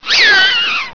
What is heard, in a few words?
alert, larva